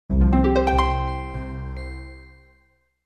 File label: Pwr ON; Huawei U2801